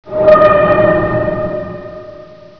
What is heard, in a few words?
creak